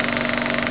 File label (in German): projektor; quake2